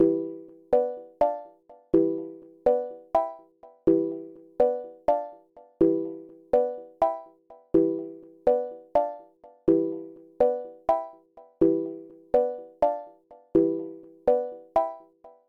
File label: 124 bpm piano